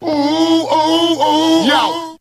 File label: "ooh ooh ooh ooh yo", voice; ooh ahh